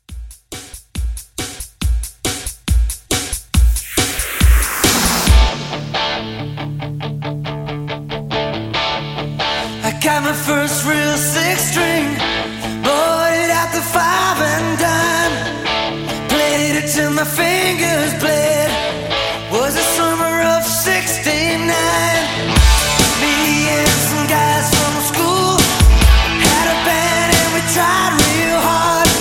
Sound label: 80s Pop Rock Re Drum